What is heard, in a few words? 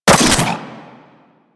Seer Fire Mech, Weapons; Warframe